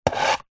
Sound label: household plate plastic childs scrape wood table